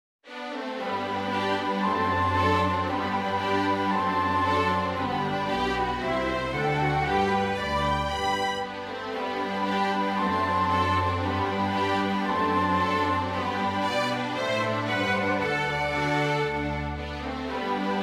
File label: gavotte retenu, ballet pantomime; la chercheuse d'esprit